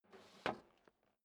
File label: step boots metal rattle scuff; schritte; Footsteps